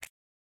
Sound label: button Up; reef raider